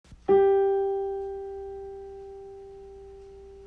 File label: Soprano G note